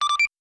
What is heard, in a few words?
local start, radio sounds; Task Force Arrowhead Radio; teamspeak